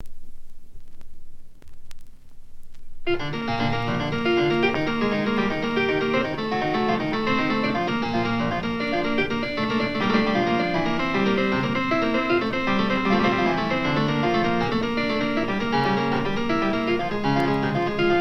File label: Partita No.1 In B Flat Major, Gigue